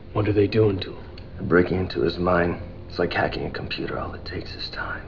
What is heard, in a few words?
breaking